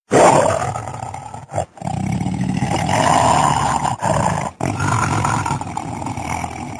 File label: Tiger Roaring